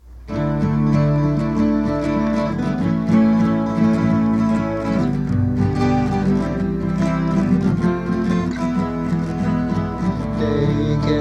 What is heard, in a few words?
Jaybird acoustic